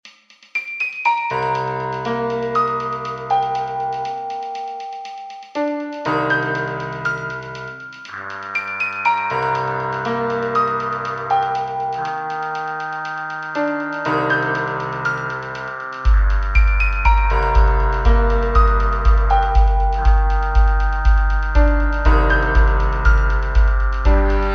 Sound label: cello violin jam